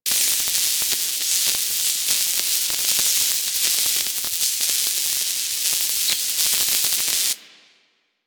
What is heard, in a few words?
Small Spark Shower Loop; Ambience; Props; Warframe; The New War; Zariman; Quests